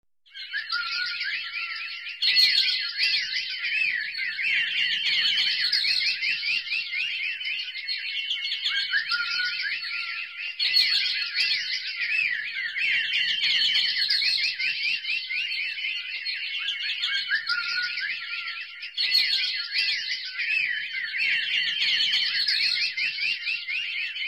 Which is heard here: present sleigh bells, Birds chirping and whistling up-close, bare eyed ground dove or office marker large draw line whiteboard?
Birds chirping and whistling up-close